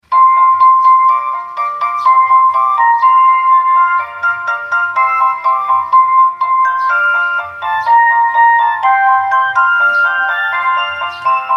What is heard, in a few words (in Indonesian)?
nada dering es krim; campina